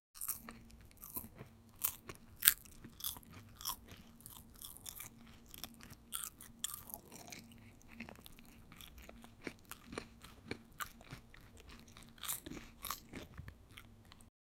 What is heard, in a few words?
someone eating